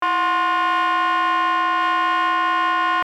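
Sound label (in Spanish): Complejos, saxofón multifónico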